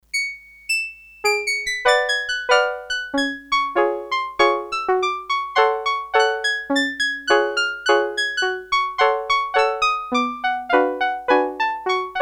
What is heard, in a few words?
Acoustic Piano, Sequential Circuits Six-Trak; Factory Programs